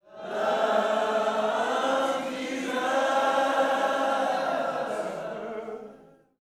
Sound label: LOVE JESUS, Vocal Gospel